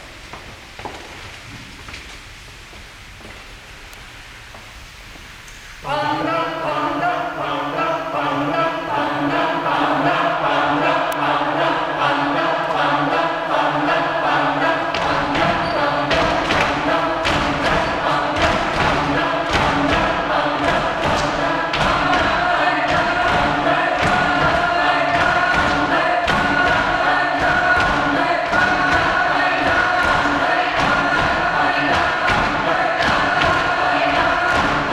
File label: Panda Chant